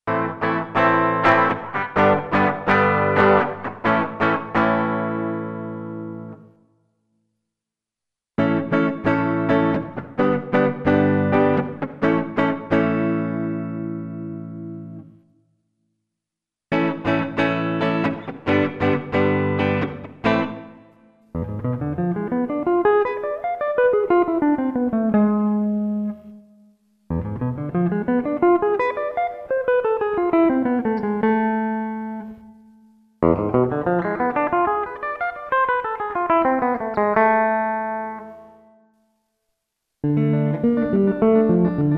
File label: Rhythm